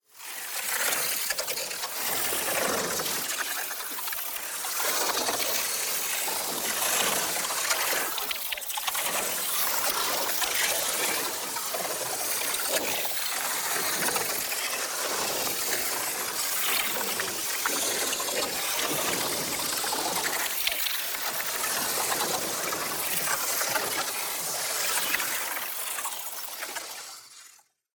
PARTICLES Sliding Sparks; boom library; Matter

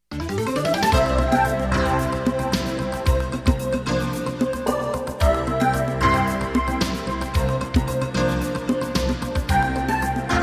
Jingles; 90 DDD Jingles

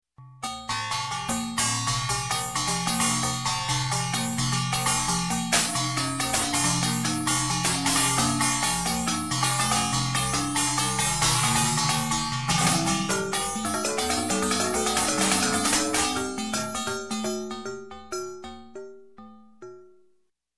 Space Space Chimes, Playing A Tune, Electronic; Sound Ideas 2000 Series

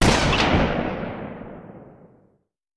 Rifle; Sound Ideas; Guns

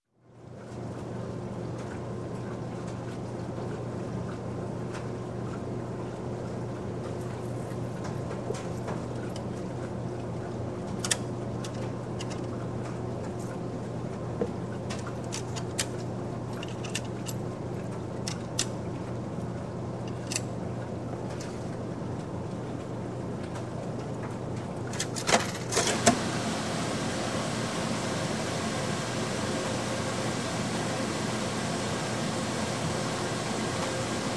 Laundromat, Washer Washer Insert Coins, Water; Water Filling Tub; Sound Ideas 2000 Series

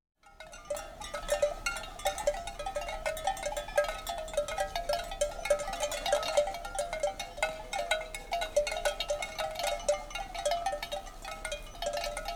Birds single bird in wood; Birds, Insects & Animals

